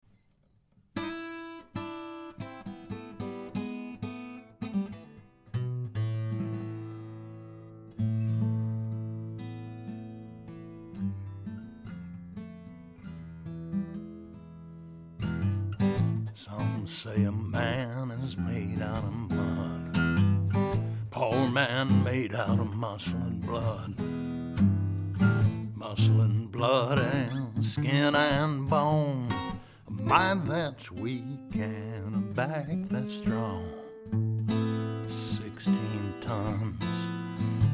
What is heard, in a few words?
470, Ward Beck 470, KM84 around the 14th fret and a U87 on vocal; one KM84 around the 14th fret and a U87 on vocal